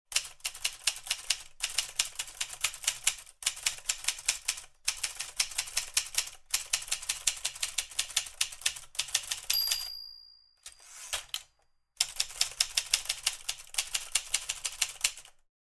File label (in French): machine a ecrire bruitage